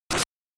GB Fighting Attack

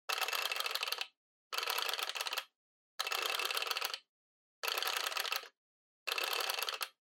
Flip Clock Time Dial Fast

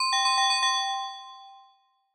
Quiz Correct Answer

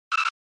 Action Brake; SNES

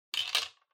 Bingo Machine Drop

